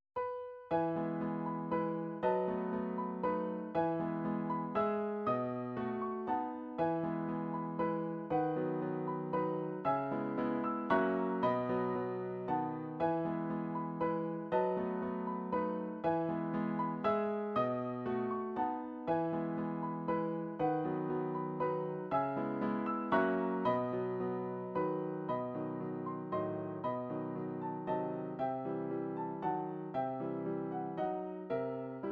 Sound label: waltz, midi